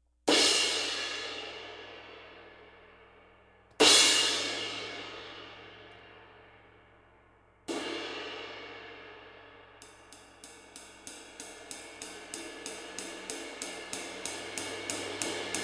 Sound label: 22 Agop; Istanbul